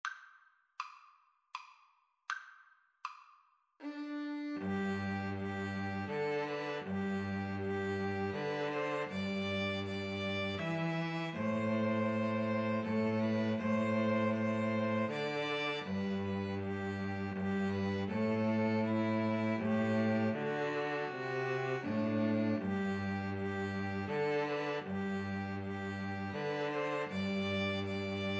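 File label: away in a manger 2 Violins Cello karaoke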